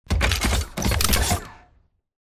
combat idle to run forward; Titanfall 2

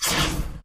bullet generic titan shield scatter; Titanfall 2

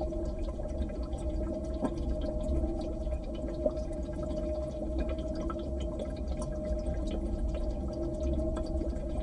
robot cylinder; Titanfall 2

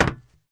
Grunt Mvmt FS Walk Solid Metal; Titanfall 2

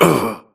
grunt hits; Titanfall 2

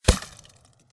imp bullet lightballistic impactsweetener; Titanfall 2

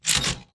bt footstep jog parts; Titanfall 2

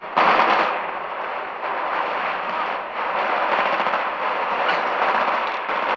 under fire east tower; Titanfall 2

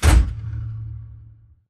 sliding door ext verb open start; Titanfall 2

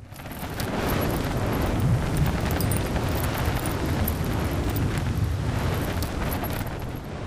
atlas eject pilot descent foley longer; Titanfall 2

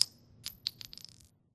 9mm shell drop; Titanfall 2

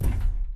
footstep jog; Titanfall 2

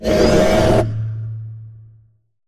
pod door ext verb open slide; Titanfall 2

